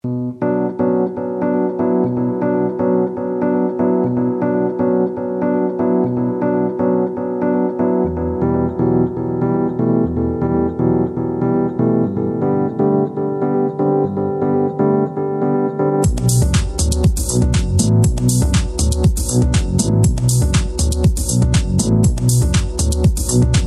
Funky Beats, Cybernetic Trance

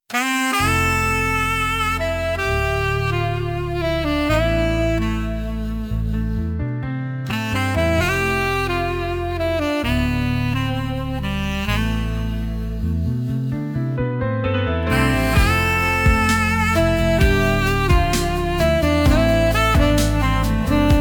midnight sax

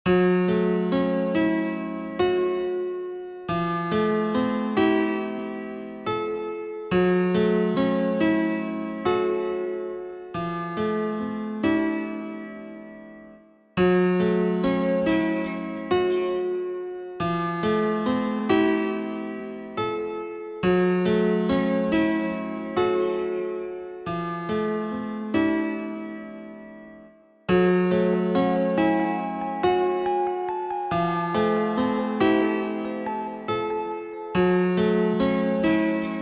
gentle sound 432 hz; spiral; Spirala

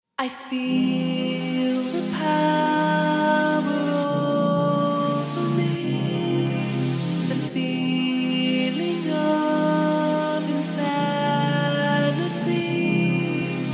Slow Funk Vibes; Sunset Rhythms

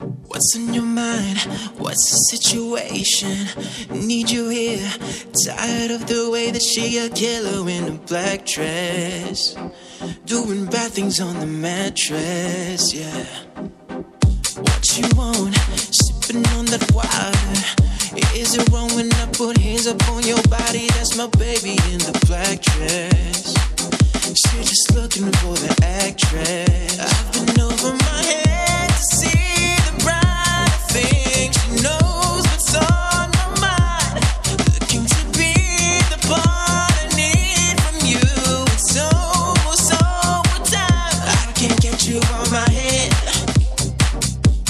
Dancefloor Groove

